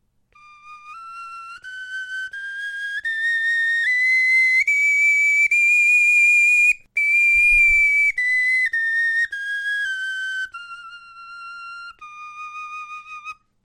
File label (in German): tonleiter, knochenfloeten